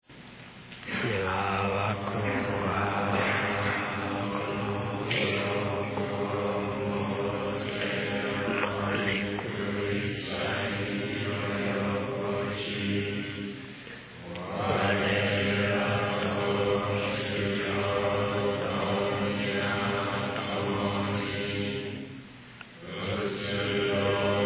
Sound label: end chant